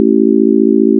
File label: synthesised chord whole freqs